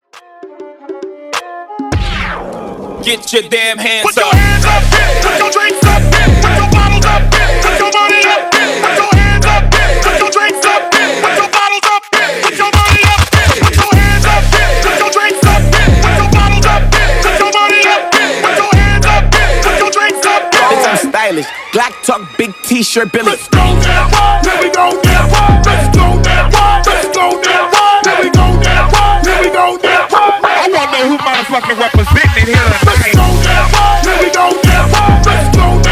Party Breakz 100 BPM

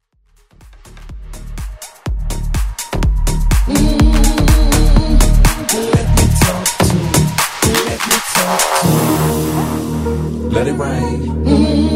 House Vocal

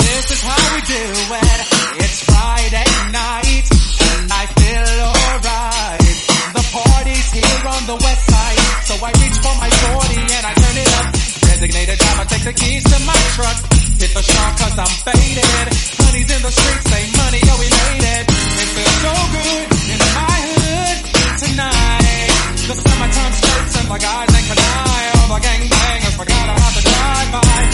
105bpm C

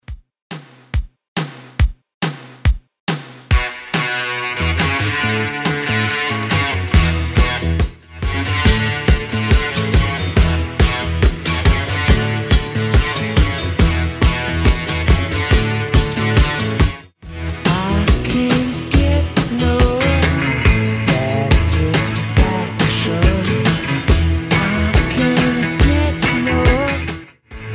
60s Redrum 70bpm

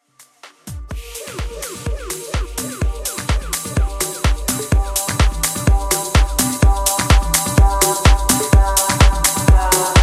Original Mix

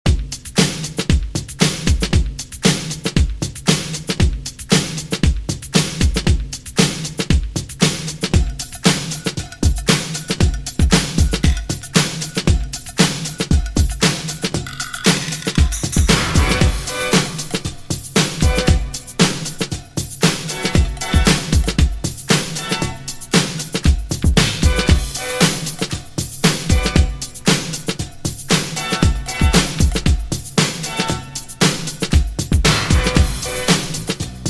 Funk 80s Redrum 116Bpm

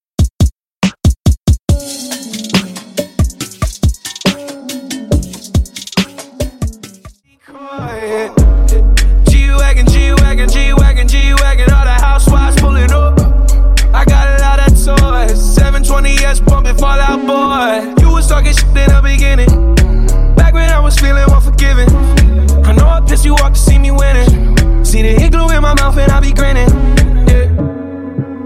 Redrum 70 100Bpm Clean; Tranny Ext

